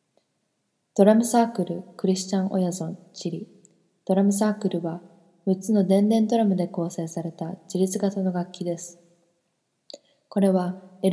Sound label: drumCircle; jpn